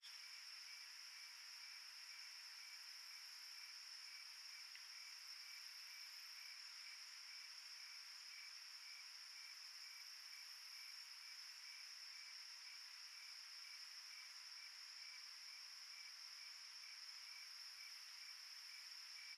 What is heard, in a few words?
Ambience Crickets